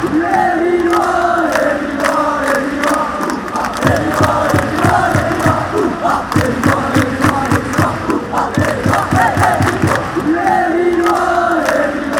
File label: oohhhh ahhhh, chants